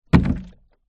industrial cannister small plastic liquid down concrete